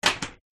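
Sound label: Ping Pong Racquet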